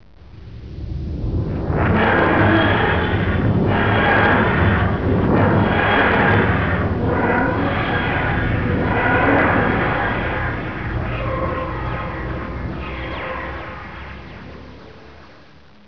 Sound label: Tardis; drwho